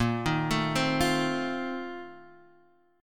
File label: Bb Major 7th Suspended 2nd Suspended 4th, Guitar, DADGAD